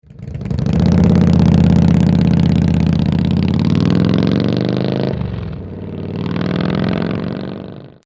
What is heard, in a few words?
TAKEOFF, motorcycle; Vance & Hines 4" Turn Down Slip-On Mufflers for Harley Touring